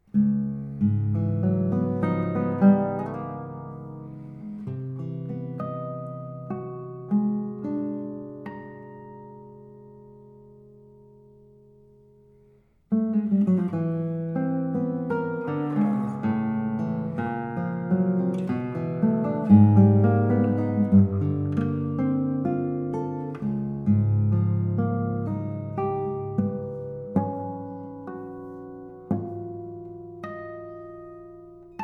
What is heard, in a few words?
Prelude, Guitar